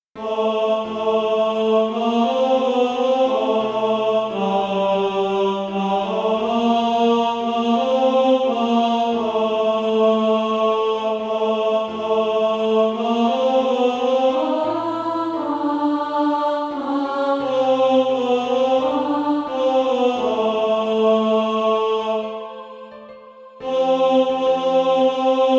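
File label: tenor; SATB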